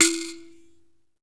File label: PERC, Dance Mega Drums